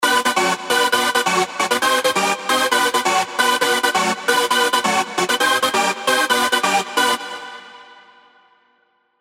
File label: rave stabs; Club Bizzare; revealed; Spire